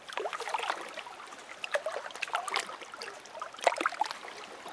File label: pond; Mafie 1